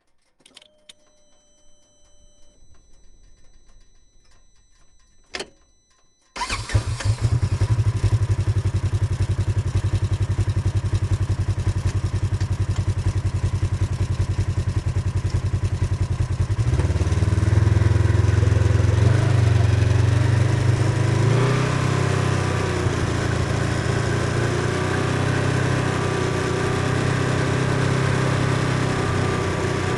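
polaris rzr onboard start slow drive stop reverse stop drive stop off engine mix; Sonniss GDC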